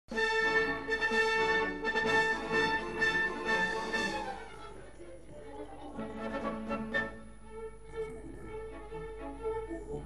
nhac nen tro choi; duoi hinh bat chu

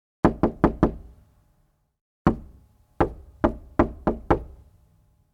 Tiếng gõ cửa gấp khẩn cấp

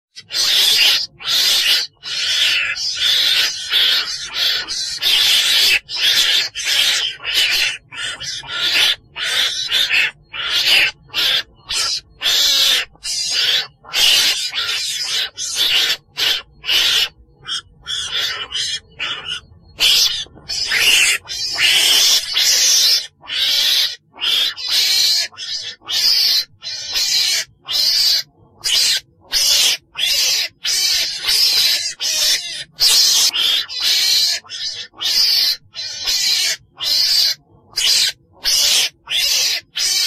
tieng chon den keu goi bay

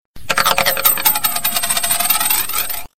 Nhac chuong tin nhan dong xu roi

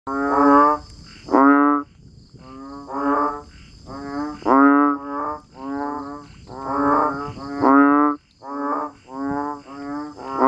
tieng ech uong keu

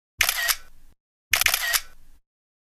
am thanh chup anh camera iphone 2 lan

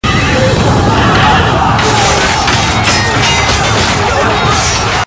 Am thanh chien dau o chien truong

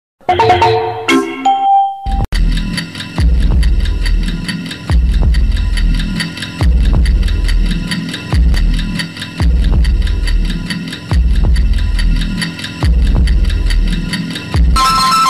nhac tinh gio; rung chuong vang

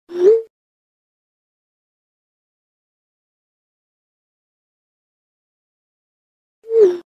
tieng gui va nhan tin nhan imessage iphone

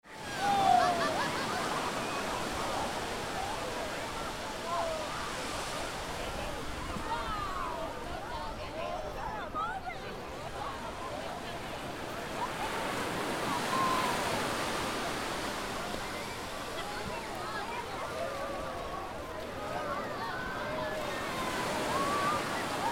Tieng dam dong ho het vui ve ben bo bien

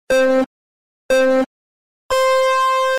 Am thanh dem nguoc bat dau cuoc thi

